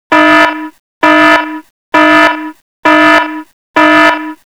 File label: Siren; Samsung; SGH-J700